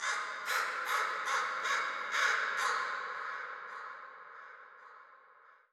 crow, ambient, nature, birds; Portal 2

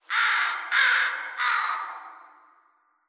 amb crow caw

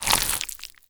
slide; headcrab classic, movement; creatures

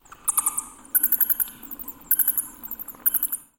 amb animals bat squeak

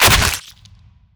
flesh impact bullet; physics

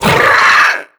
headcrab reviver vox run start; creatures

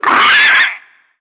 taunt screech, creatures; vox

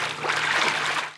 wade, footsteps; Counter Strike Model Pack; CS:S

